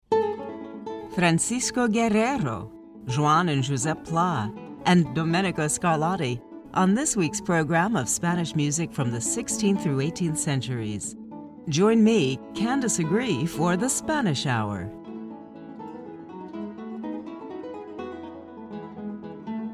spanish music from the 16th 17th and 18th centuries